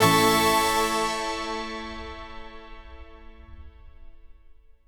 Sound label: Clangorous Pad